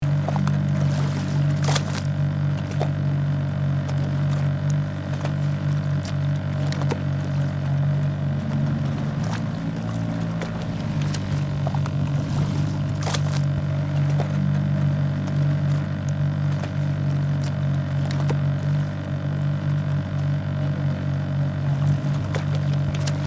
Motorboat whirring idle gentle sea waves loopable

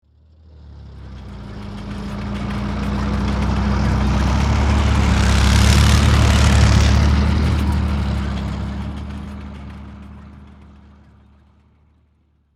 Tank passing by tracks rolling

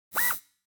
Sci-fi robotic servo motor small

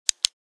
Tactical light button click

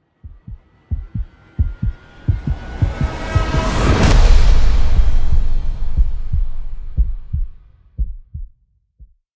Cinematic riser heartbeat

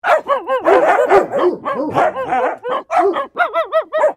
Dogs barking and whining

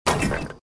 Chain basketball net

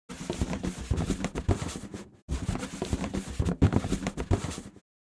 Rubber balloon friction handling

